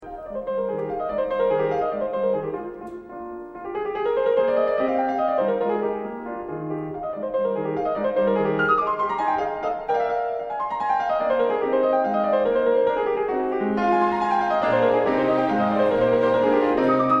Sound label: Violin Concerto No 9; Mozart; Jeunnehomme